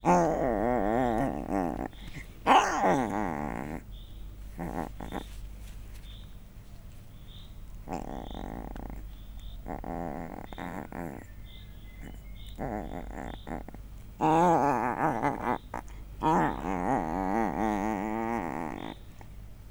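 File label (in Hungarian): három hetes kölyök párducétől mennydörgés, leopárd; miskolci zoo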